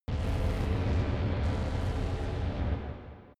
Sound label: space slow ship; raumschiff